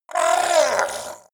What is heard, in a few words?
fishman shout pain